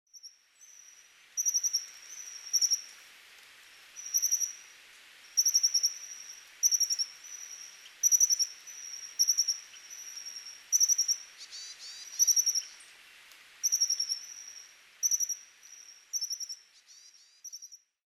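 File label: Japanese Accentor; kayakuguri; chichibu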